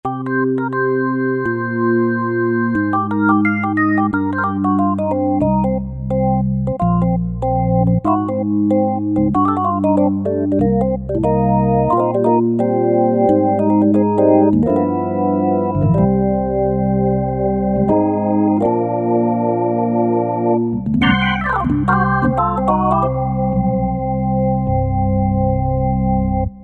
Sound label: Organomics, synth